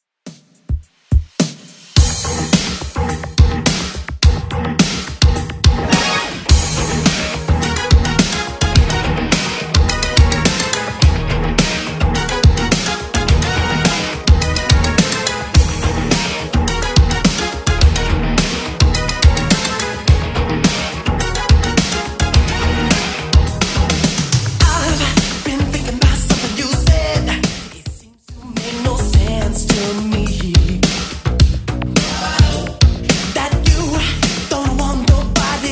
80s Redrum, 106bpm

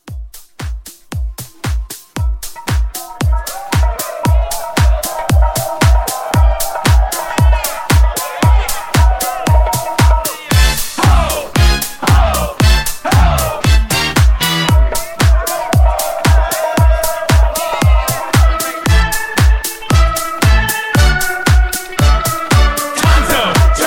80s House Mix

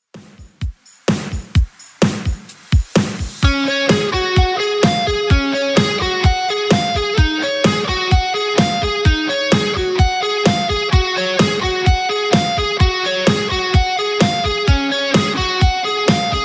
80s Rock Redrum 128bpm

